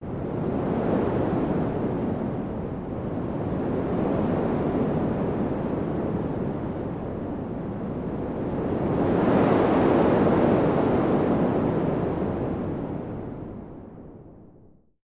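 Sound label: heavy wind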